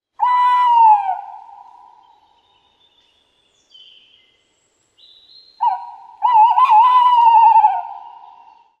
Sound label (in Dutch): Bosuil Zang Nederland